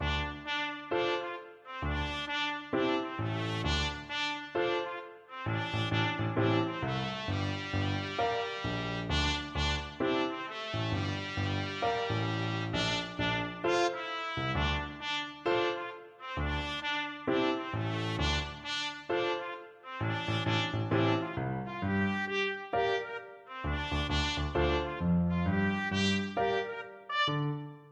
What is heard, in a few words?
hip hop house, trumpet